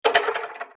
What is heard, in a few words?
wood collapse